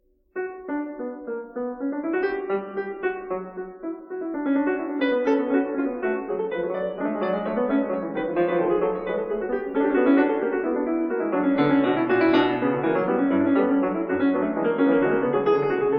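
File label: No.24 in B minor - Fugue, piano; BACH; WTC; BOOK II; Feinberg, Samuil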